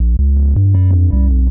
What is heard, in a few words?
Acid Bass